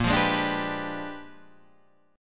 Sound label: Bb 13th Flat 9th; Bb13b9